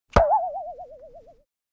Cartoon accent impact boing

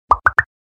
Multiple pop

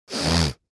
Human sniffling quickly

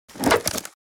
Wicker basket lid rustle and close

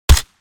Spec ops assault rifle single shot

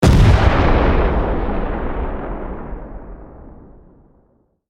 Cannon fire single shot; artillery; gfxsounds

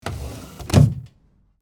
Wooden drawer full close; household

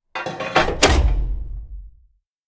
Armored tank cannon loading

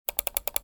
Multiple click

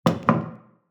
Setting glass on glass table

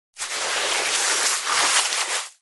Hand digging in sand with pebbles

